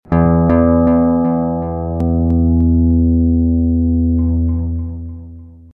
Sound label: tempo change